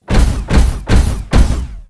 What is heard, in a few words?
mecha run